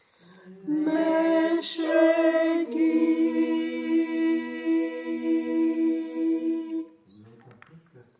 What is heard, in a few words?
tutti